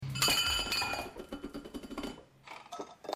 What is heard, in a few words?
china breaking